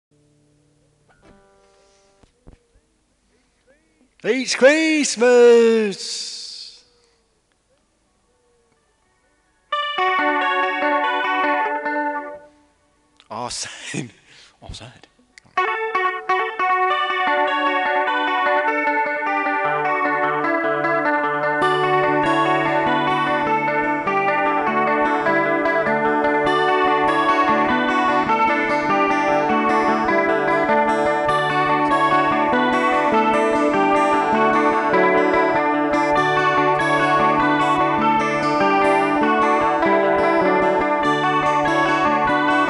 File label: Acoustic